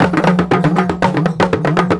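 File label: 120 COMBO, drum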